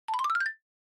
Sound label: Xylophone Up Short